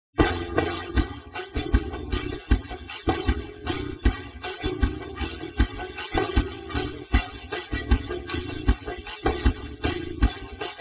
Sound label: Jazz